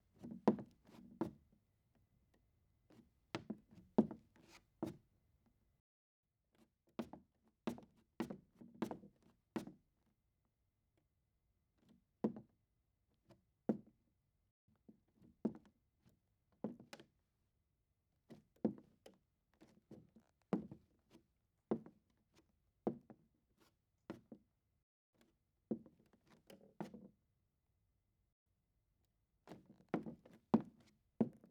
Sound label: Footstep On Wooden Floor